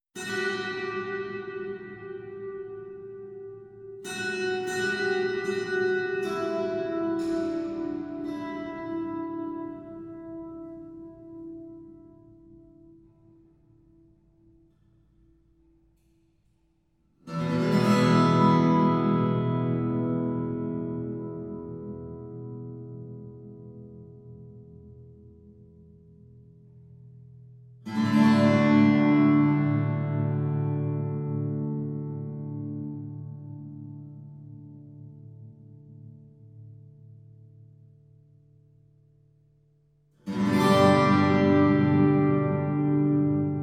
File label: great pyramid kings chamber gizeh guitar